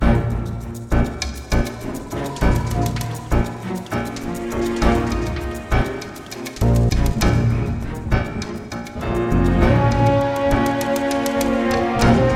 Swamp; Crysis

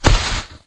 Fall down

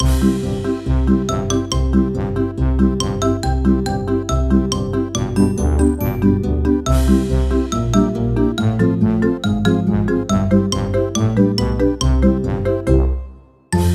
Frontend Music; Peppa Pig Theme Park Fun; NDS

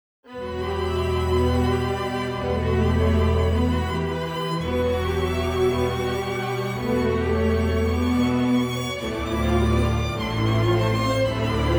Waltz; Get Even; Oasis

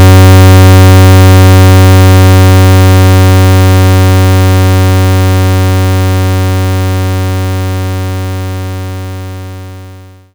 Pulse 93 75Hz; knights try

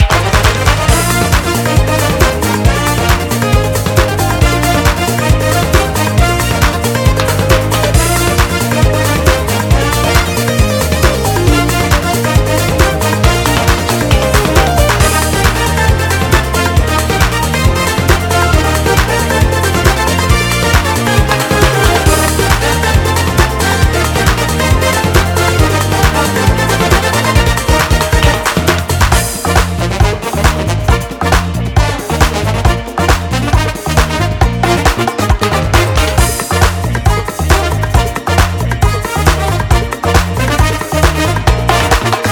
ride

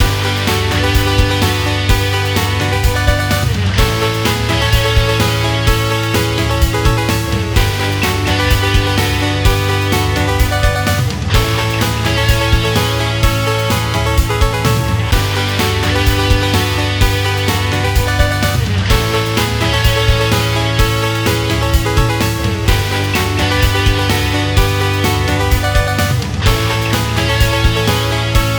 Fanfare; Tecmo Bowl Throwback

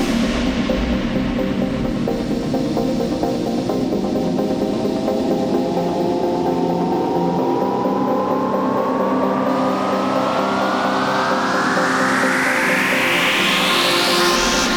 Flight Riser; Megaton Rainfall; PS4

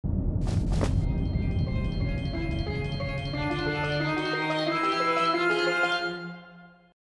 Sword; Infiltrating The Airship; Biggol Sword

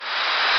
SPRAY; Mr. Bean

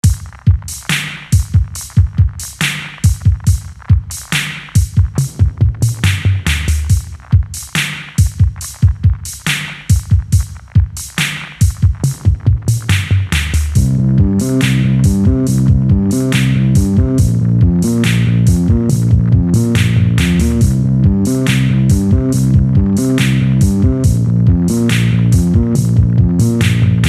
Jazzy groove; Demonpact Clarice

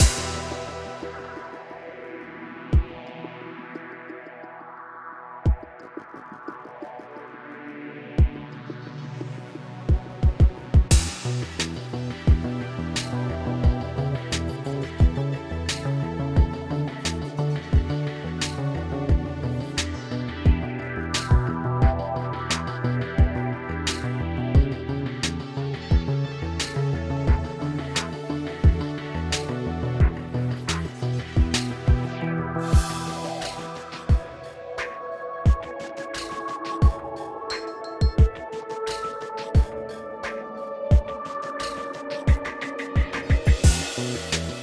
Reactor Mix Stem Layer

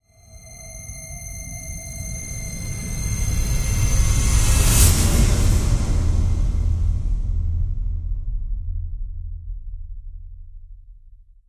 Swell; Friday the 13th: Killer Puzzle

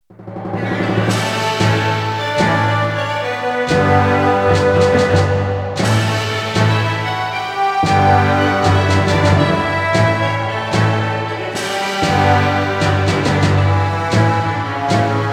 Bombardment Victory; Skies of Arcadia Eternal soundtrack

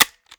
Button

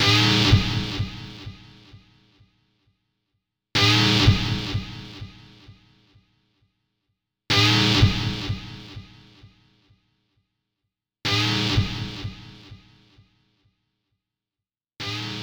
Headshot Delay; Orcs Must Die

